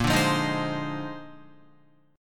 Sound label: A sharp 11th, Guitar; strummed; JGuitar